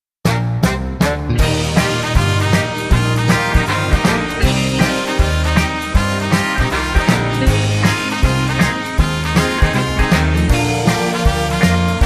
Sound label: (Eb) No Backing Vocals